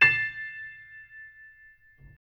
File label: Am highest, chords